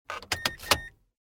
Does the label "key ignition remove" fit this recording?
Yes